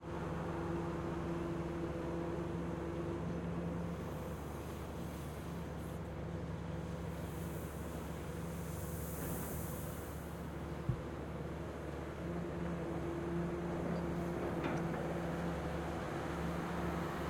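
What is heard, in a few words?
Ambience, Port, Exterior, Bulk offload, Engine, Gravel, Clatter, Heavy; Hzandbits; Industrial Exteriors I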